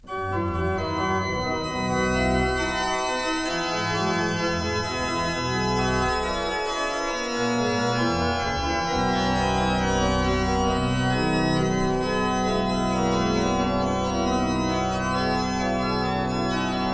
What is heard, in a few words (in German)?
Praeludium Orgel Matthaeuskirche; Neckarau